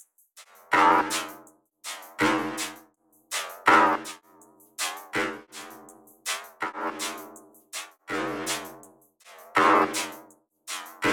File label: Beat weird; 81.5bpm; Pony Fan Music Remix Stems